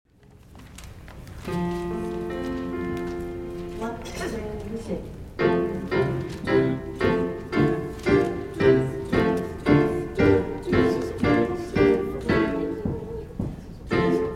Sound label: all on doot; Lord Who throughout These Forty Days; vs 4 and 5; Gather Hymnal